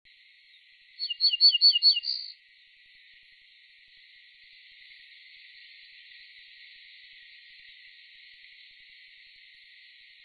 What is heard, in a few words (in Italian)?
ortolano